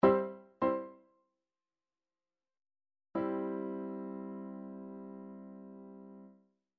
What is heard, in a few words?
midi chord lines big band